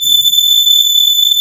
CMI ORGAN

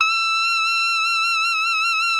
Tenor FFF E6, Sax; Greytsounds - Philip Wolfe David Crozier's Saxophones; TENOR SWITCH

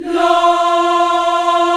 VOX African Las; Roland LCDP11 Africa VOL-1

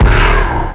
NOISE MAD

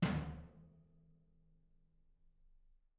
R B Floor Tom B Room; Toms; Sonic Foundry (Sony Creative Software) - Drums from the Big Room The Mixes; R&B One Shots

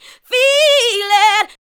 ADLIBS 90; Ueberschall; Jam Box

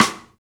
Rock Stick, Rimshots; Roland BK-5

